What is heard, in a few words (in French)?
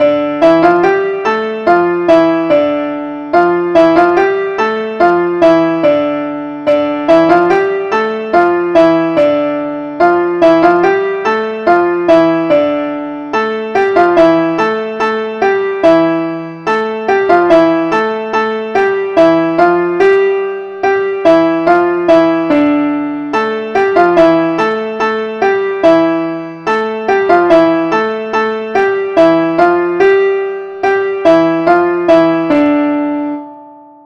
suite plin